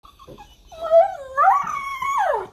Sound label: yawn